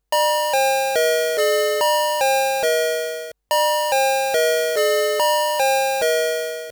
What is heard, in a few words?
7 tone